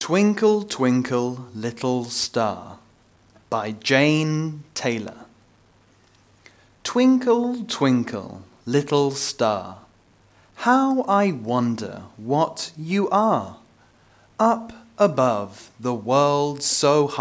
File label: Twinkle Twinkle Little Star